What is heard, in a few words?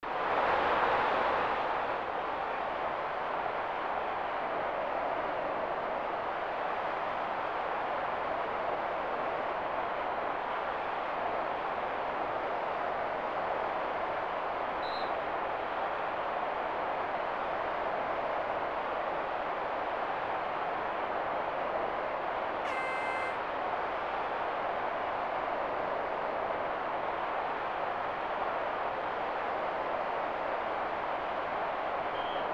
Crowd; WWF Raw; SNES